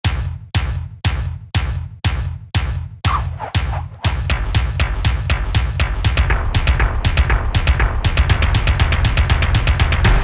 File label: Ring Tone